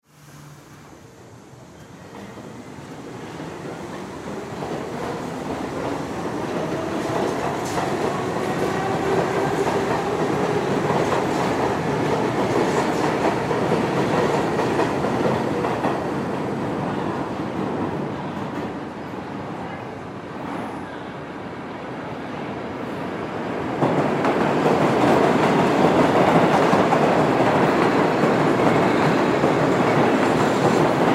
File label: Subway Exterior, Subway Exterior Train Pass By Stop Pick Up Go; Subways